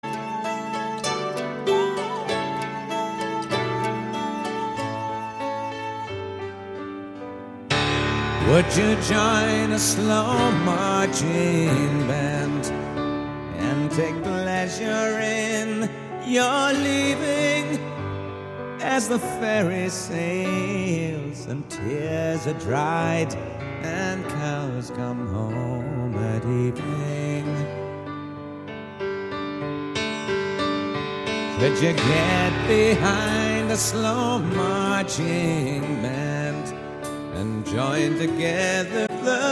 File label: slow marching band